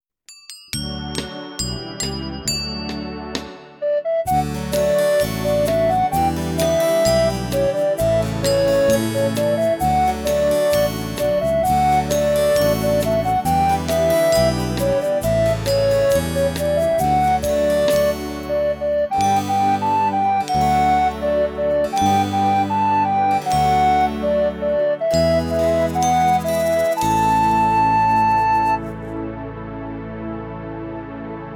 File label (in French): silence playback